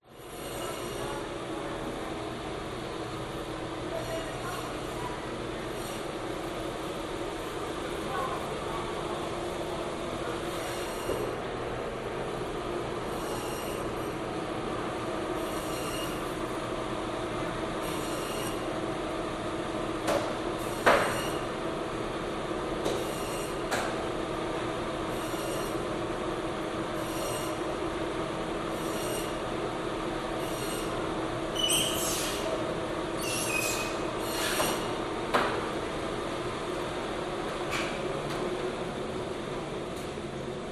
ambience fish market night quiet machines sweeping Los Angeles Long Beach San Pedro